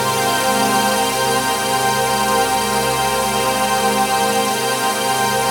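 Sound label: trance pad; Best Service; XX Large - Pads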